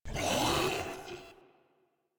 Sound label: Proto Infested Ancient Vocal Pain, Enemies; Warframe